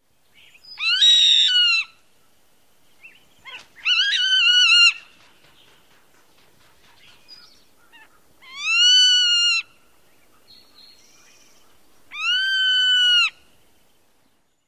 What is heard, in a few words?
calls near nest, ZOTAHA